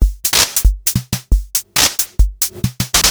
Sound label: Fricke MFB 502 Kit; Sliced